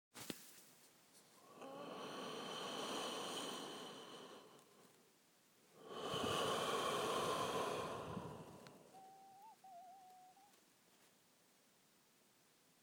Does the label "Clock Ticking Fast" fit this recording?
No